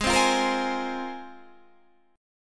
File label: Ab 6th Add 9th Ab, Guitar, DADGAD